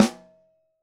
SN1 Top, Snare; Ableton Foundation; Snare Designer

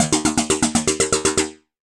Bounce Along, Synth Rhythmic; Ableton; Glitch and Wash

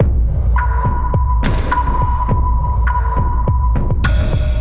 Impact Grip Kit - 104 bpm, drums; MIDI Clips; Ableton; Mood Reel

